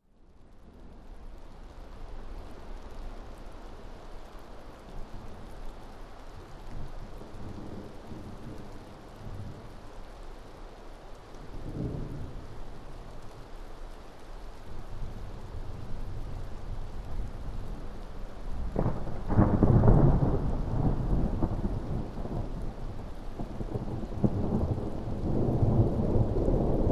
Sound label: thunder